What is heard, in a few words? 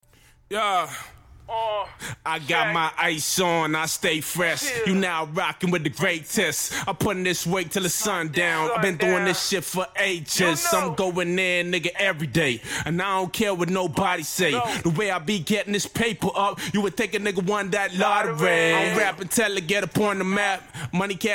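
hip hop 120 F minor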